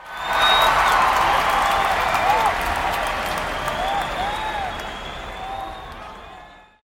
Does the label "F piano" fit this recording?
No